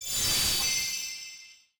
scatter FS Landed; turn your fortune

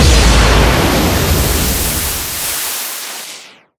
big win start fx; dazzle

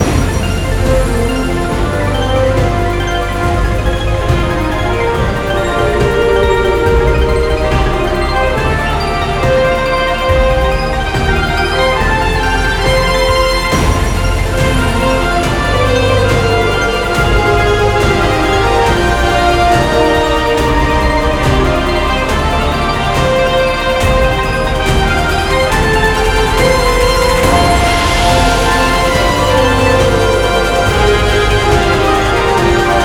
super mega win

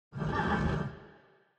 wild walking random noise; godsoffortune

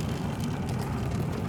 reel Spin; jack and beanstalk

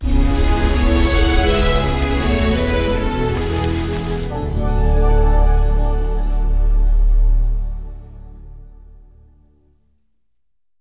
outro Free Spin; fairyhansel

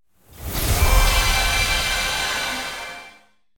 random Symbol Transform; fairyhansel

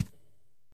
reel Stop; fairyhansel

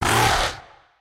snort; the wolfs night

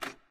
reel Stop; cashomatic

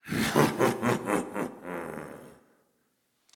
evil laugh; arcane

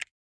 button Click; hotline

